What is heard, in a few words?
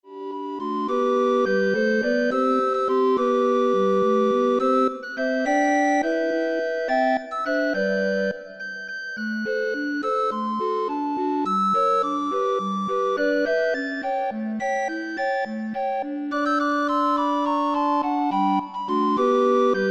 Recorder Ensemble